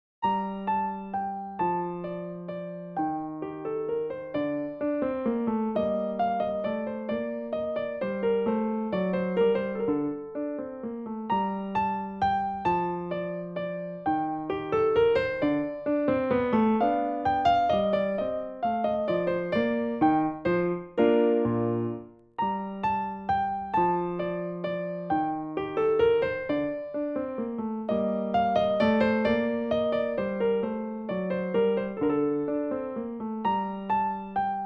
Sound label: menuet